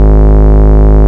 Bass, Root G#; Vengeance Samples

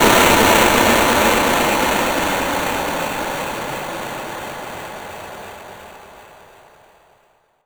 FX Downlifter

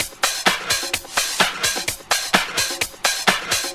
House Loop, 128 BPM; Vengeance Samples

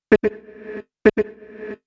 House Loops, Vocal Loops House 128BPM

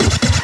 Vinyl FX and Scratches, FX Scratch

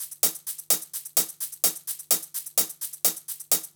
House loops 128BPM; Vengeance Samples

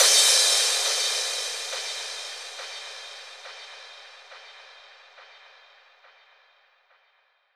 Brandmark Kit 139BPM Crash; Drums & Perc; G#minor

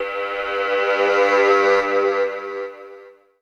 Tonal FX G

